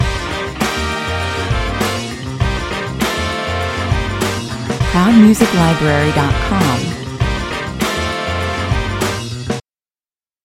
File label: funkin yell loop